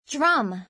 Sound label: drum